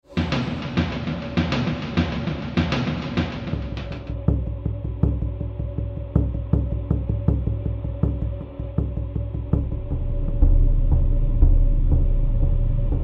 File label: echo patterns; dark city